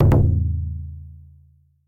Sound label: drum japanese